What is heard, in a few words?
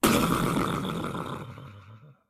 splosions; SyrinScape; Syrinscape unplugged; Jungleplanet dinolaser battle